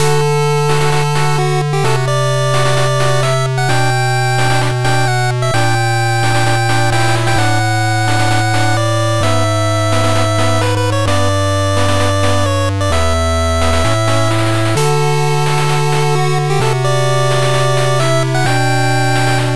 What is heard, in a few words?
8bit The Journey Begins